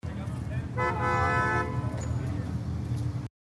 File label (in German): Silvester Hupen Menschen